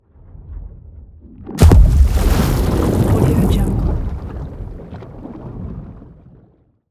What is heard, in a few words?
water explosive water hit; magelem; soundmorph